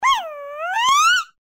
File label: scream; Bouncy Balls 2; red